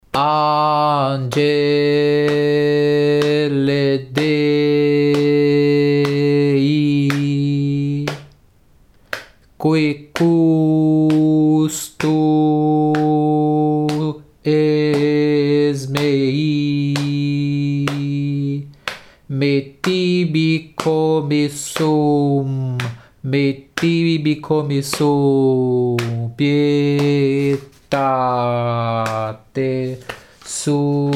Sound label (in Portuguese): leitura ritmica soprano